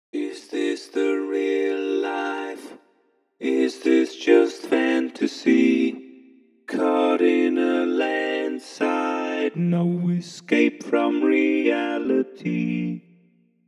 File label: midi choir